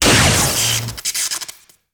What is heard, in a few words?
Electric Ball